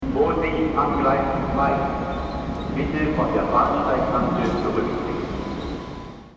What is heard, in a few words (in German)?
warnung